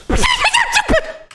section fail; Aristia Boop (Span x Swoana Edit)

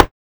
drum hit whistle; megarekt

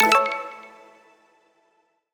menu play click; 『Mikan』

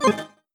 menu exit click; Crimson Stella

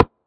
menu hover; « DEKU » - MHA

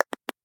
pause back click; megamix

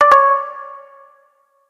section fail; DEKU; MHA

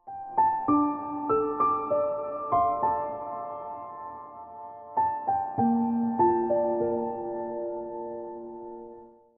pause loop; osu skins; megavaxei

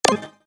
pause exit click; Crimson Stella

